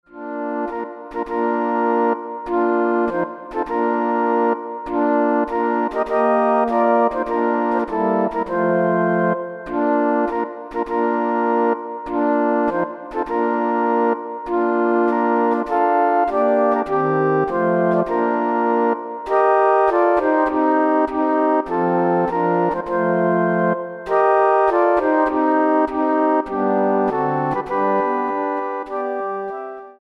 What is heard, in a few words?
Flute Ensemble